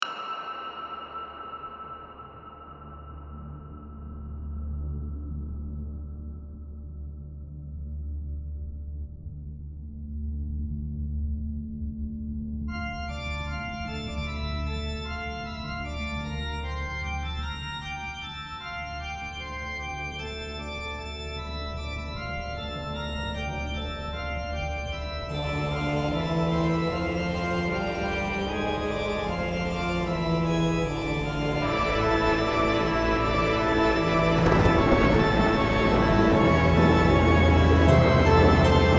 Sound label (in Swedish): OUVERTYR; Så Tuktas En Argbigga; Dramaten, 2025; Komposition & ljuddesign; Akt Ett